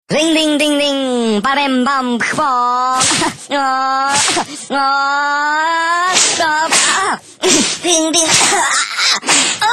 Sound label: Whipping a frog